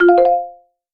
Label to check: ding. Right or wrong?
Right